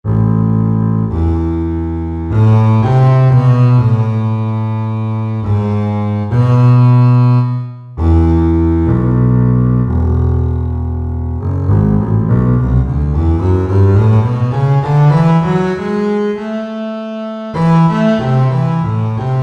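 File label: Solo Contrabass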